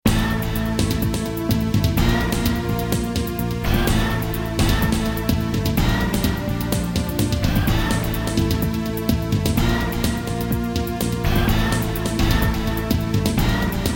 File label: music victory; magneto